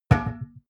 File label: glass door small close